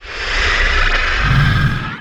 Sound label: pit of malice start; underlord